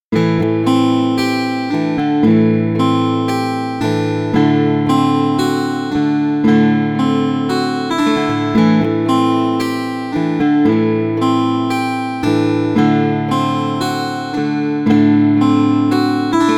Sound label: open guitar no effects